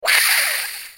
dilophosaurus hiss; crossyroad; dinosaurs

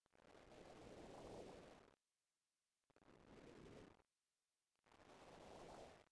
fire dead; adarkroom

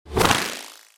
fly; stackbounce

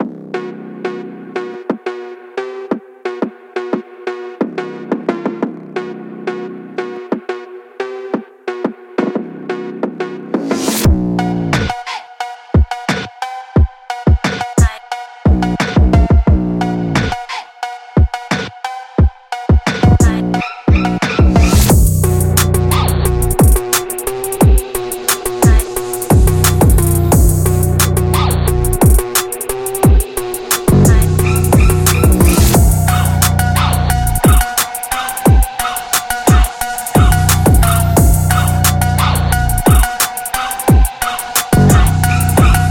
Inst; fridaynightfunkin

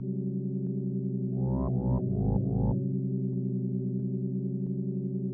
event plague; adarkroom

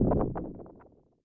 asteroid hit; adarkroom

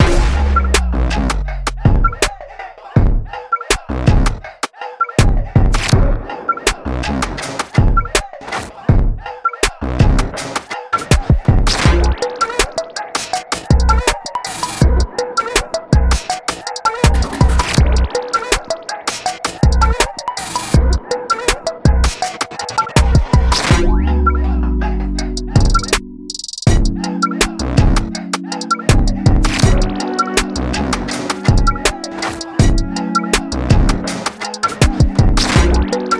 Inst; fridaynightfunkin; pico

